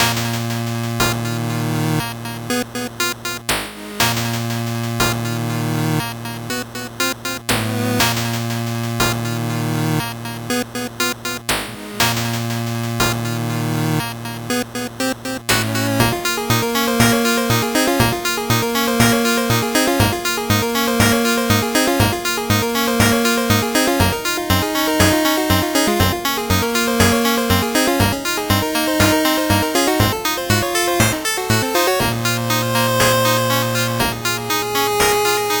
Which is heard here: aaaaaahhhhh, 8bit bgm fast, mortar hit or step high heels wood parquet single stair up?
8bit bgm fast